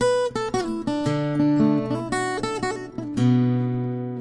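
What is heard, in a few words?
acoustic guitar, guitar; acgtr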